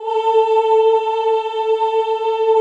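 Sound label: choir A3, Ooh; Ooh + Ooh 2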